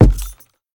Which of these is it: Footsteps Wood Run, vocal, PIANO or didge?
Footsteps Wood Run